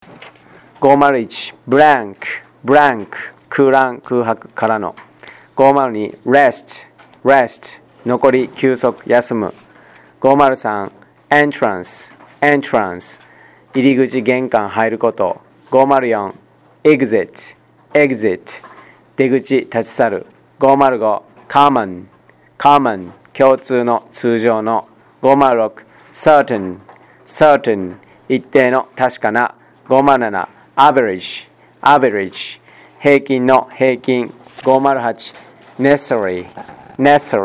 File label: tango